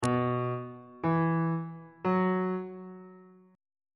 a double sharp suspended 4th triad chord intervals